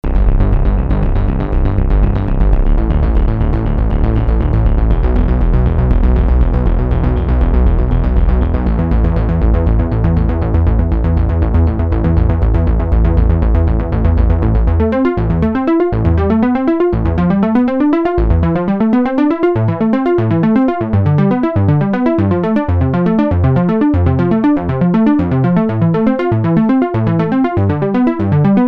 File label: Bass; Sunsyn